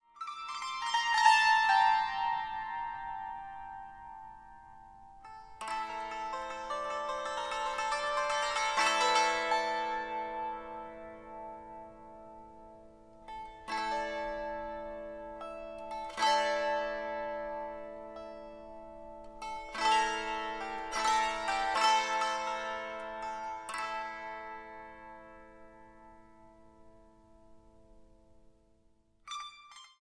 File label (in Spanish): Improvisacion, salterio